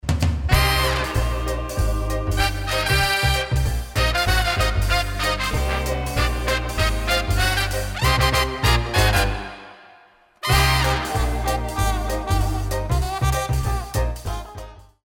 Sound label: jazz